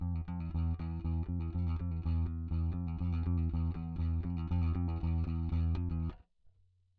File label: bass